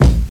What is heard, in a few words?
mid range steel kick drum sound c key, kickdrum sound tuned to the C note